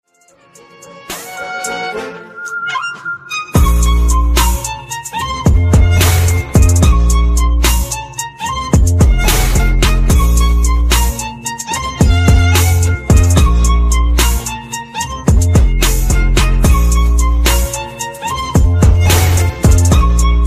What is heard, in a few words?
instrumental